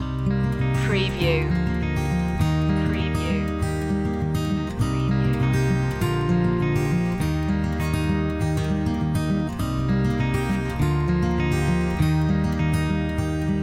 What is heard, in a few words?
ballerina no perc